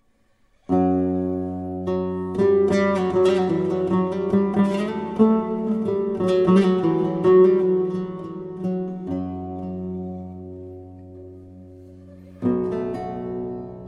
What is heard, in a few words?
lute version